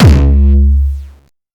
Jump kick, Jump hardstyle kick

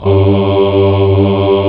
choir; 90s Sample CDs; Club-50 - Foundations Roland; Scats Choir

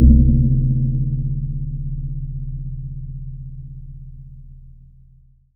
C1, Synth Line; 90 s Sample CDs; NiceBeats; SynthLine VOL-32

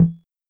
Conga Lo; EKO Rhythm Box; Drums

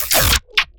glitch stab; Virtual Riot; Glitch Stabs

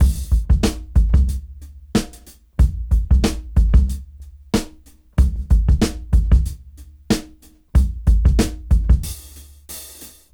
Rap 93 BPM; AMG - Keith LeBlanc Phat Butt Jams

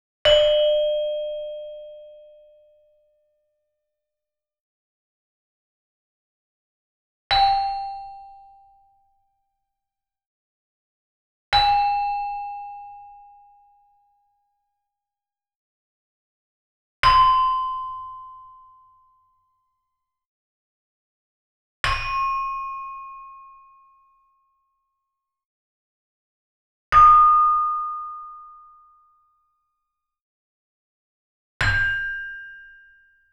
Gamelan; Propeller Island - Complete Gamelan

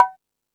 Beat Box; E-MU Pro-Cussion

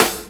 SD, Dance Mega Drums; Best Service; SD-HIP

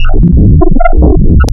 AMFM Sine, wavetables, Hybrid Modulation; Architecture 32

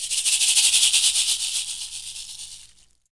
shaker, shaker FX; Roland LCDP14 Africa VOL-2; Perc FX

